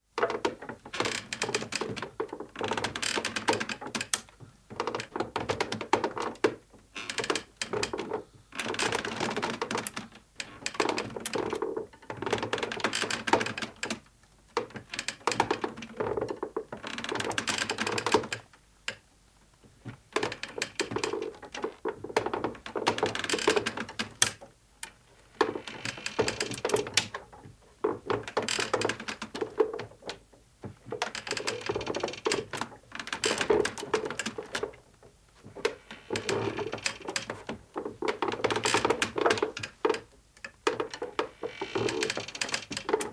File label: Dry Wood Creaks - Various creaks and strains - good for old boats / ships; Creaking